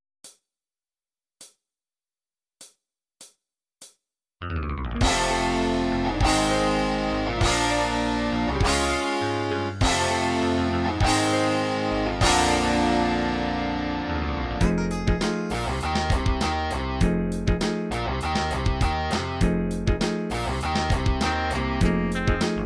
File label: blues